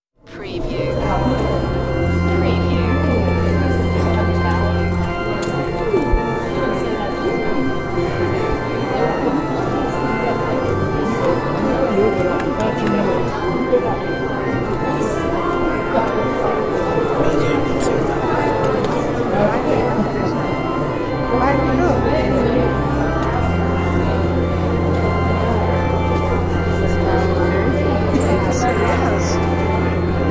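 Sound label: Church Sanctuary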